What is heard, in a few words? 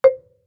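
Marimba deadstroke fortissimo C2; MIS Pitches - 2014; Percussion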